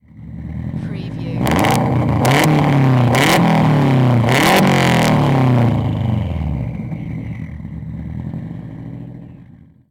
SUBARU STI EXHAUST MULTIPLE REVS

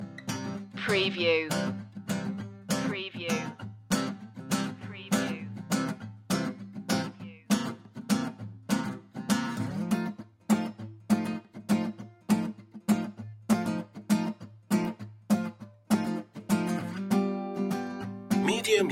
stomp loop

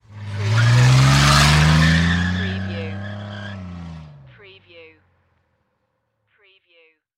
Auto Drive Away Fast; sound effects bible

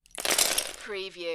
Lego, several pieces drop on floor

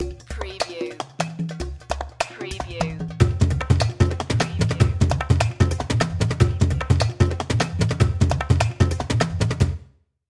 Musical; Production Element; Sound Effects Bible

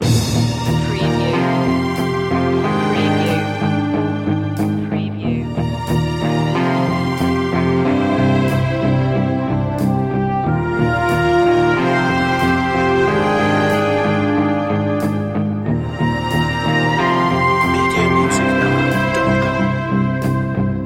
lone wolf loop

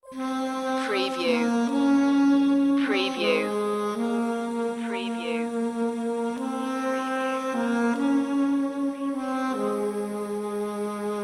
distant voice

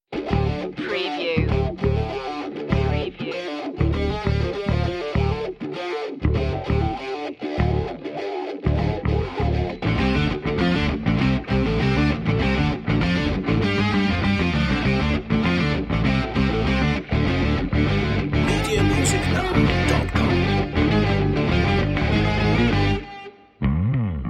hard like a rock no drums

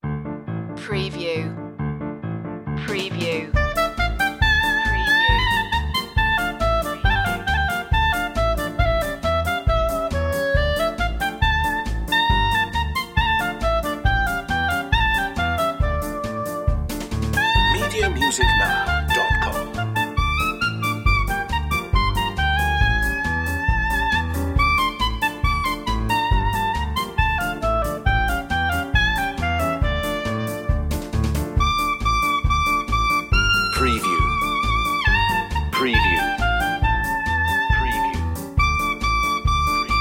klezmer

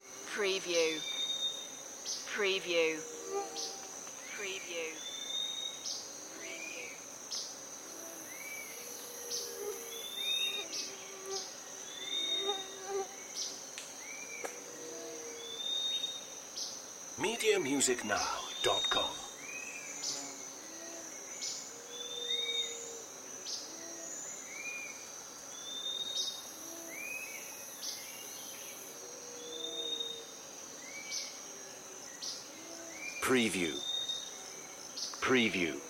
rainforest ambience

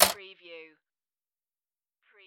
Pinball sound, dual clank

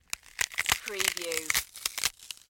BONES CRACKING

